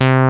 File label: BassLead; cubic